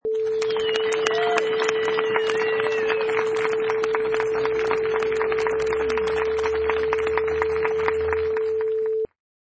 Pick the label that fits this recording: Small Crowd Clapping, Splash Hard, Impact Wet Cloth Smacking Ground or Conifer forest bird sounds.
Small Crowd Clapping